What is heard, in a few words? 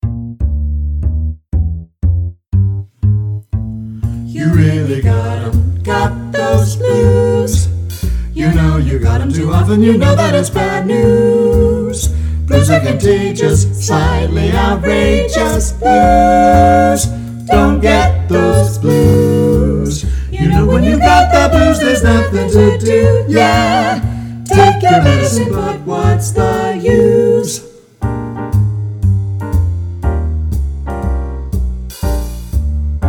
Bb Vocal Blues Etude Alto missing; 2020 Jazz All-State